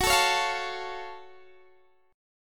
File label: F sharp Minor Major 7th F sharp Guitar Open D